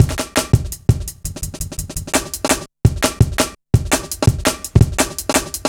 Live Wire, Drum Loops, 168.5, Jungle; Zero-G Jungle Warfare 1, 2 & 3

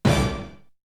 Hit; ZERO G - Datafile DISC 1

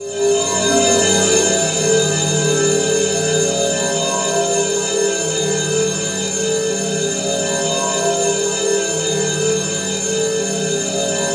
atmo, atmosph, special fx twisted; USB Soundscan; AKAI

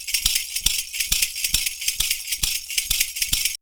PERCU; Univers Sons; Raricussions

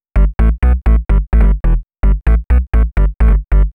Bass, uk garage, 128bpm

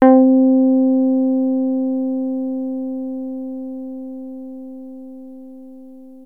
Access Virus B; Synthworx

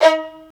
STR, strings; Roland - LA Composer vol. 1

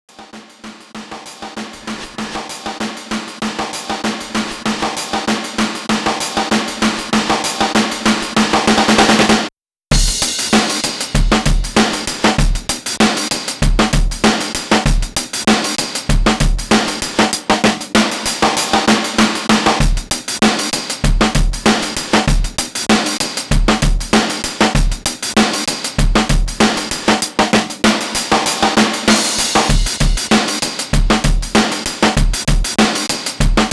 Shuffle 97; AMG - Ruff Cutz

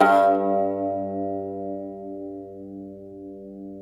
TAR G1

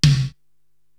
TOM Mid Ambi; Korg TR-Rack

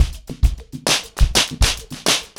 100BPM, ACOUSTIC DRUMS

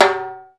TIMBALE; E-MU Formula 4000 Series Vol. 5; Protozoa; Proteus1 Instrmt

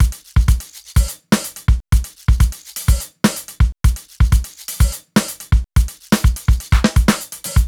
top basic, 125 Beats

